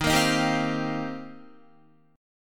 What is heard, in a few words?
Eb 6th Add 9th, Guitar; strummed